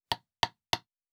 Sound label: Nail Wood, Crafting